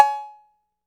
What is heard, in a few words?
cowbell; Playdate SDK; MIDI Player